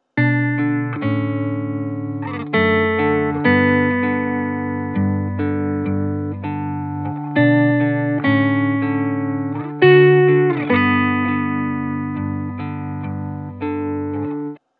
Guitar Wet

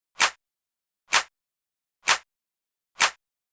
Clap

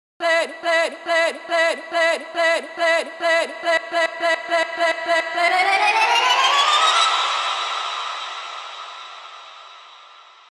Buildup Reverb

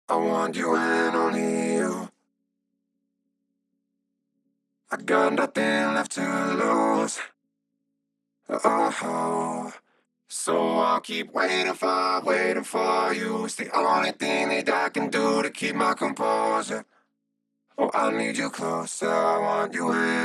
manipulator eq

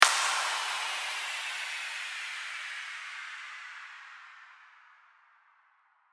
Low-cut

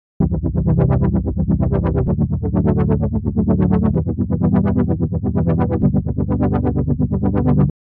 lfo

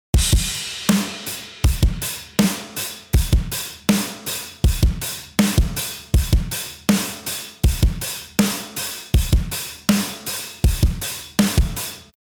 Band AMEK

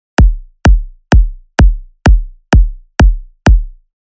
Future Bass

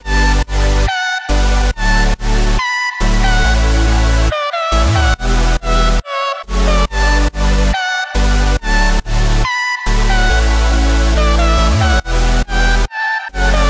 Drop Loop 140 BPM B Min; Cymatics; Millenium

